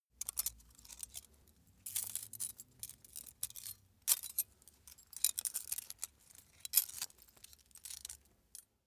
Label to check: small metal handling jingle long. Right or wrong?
Right